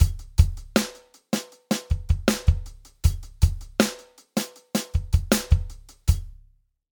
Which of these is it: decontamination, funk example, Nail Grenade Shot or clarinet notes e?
funk example